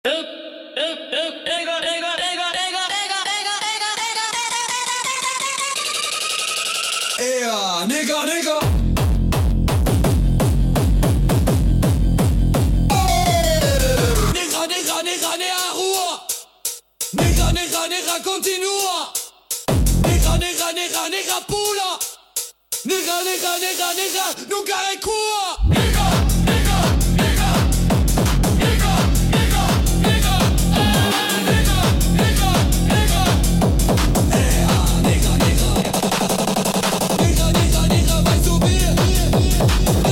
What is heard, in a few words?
Chant Funk, Phonk